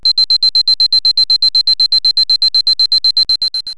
alarm beep

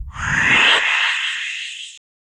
Sci fi whoosh

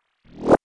Hi spinner

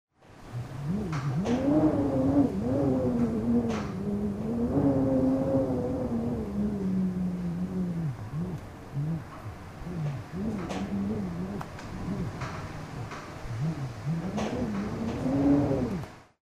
Slow wind moaning

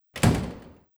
Door slam

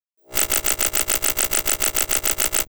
Electric strobe

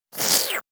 Slurp

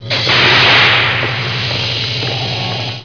door opening; star wars

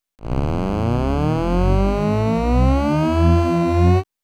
Sawtooth

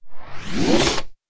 Wind up vinyl

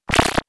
Flutter gun

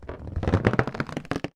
Rubber suit

